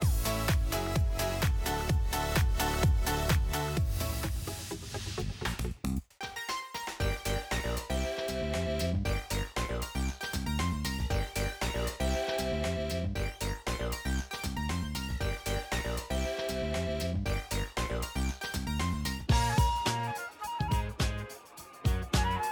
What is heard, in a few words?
Music; Everybody Hates Chris; Back to School Marathon